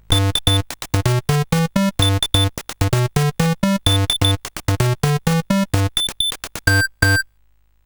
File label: sid, CSG8580R5; Coco-Notes